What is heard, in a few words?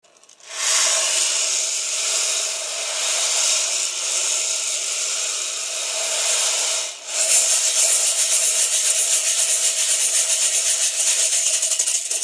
Flat Shaker Pad